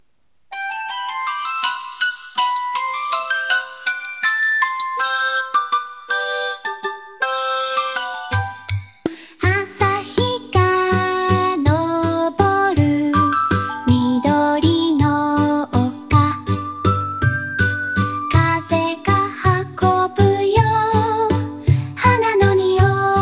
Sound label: kids song; minimax music3